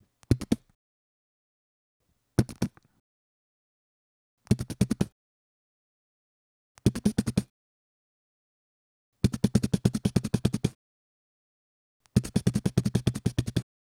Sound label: bass drum triple